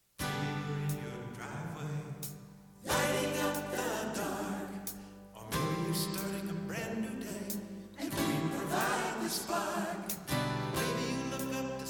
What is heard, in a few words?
JINGLE